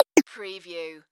Multimedia Percussion

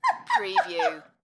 Female Giggle

